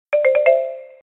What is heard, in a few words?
pinger, Pinger Text Tone Messages